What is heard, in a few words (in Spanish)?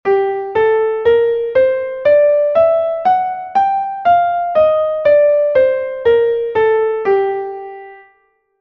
sol menor melodica